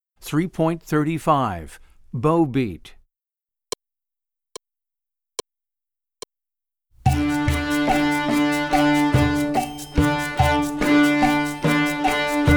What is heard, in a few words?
Bow Beat